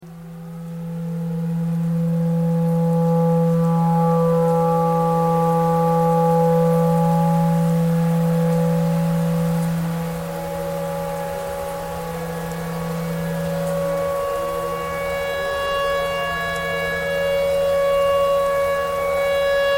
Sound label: tornado siren clarinet thread noise